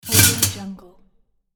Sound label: putting cutlery in basket